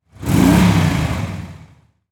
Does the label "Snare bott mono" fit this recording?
No